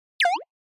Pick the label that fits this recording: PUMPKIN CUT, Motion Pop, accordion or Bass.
Motion Pop